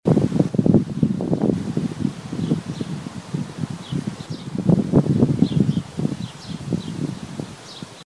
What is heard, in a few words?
Wind Noise